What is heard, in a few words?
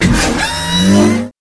gear shift